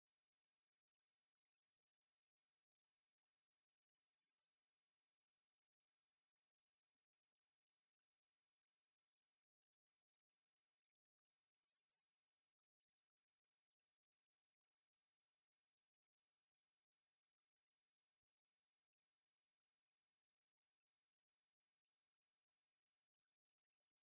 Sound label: calls, Nuthatches Creeper; BRCR; deviche